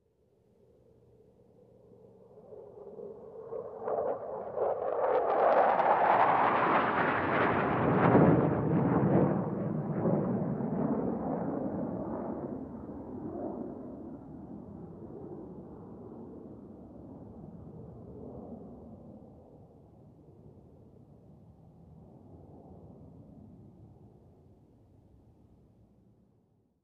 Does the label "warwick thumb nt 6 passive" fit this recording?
No